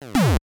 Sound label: Cannon Ball; super mario bros. 3 1988 nes